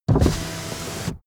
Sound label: car window front open part